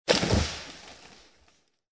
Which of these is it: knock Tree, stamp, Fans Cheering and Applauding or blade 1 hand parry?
knock Tree